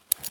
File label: pickup, grenade; Escape from Tarkov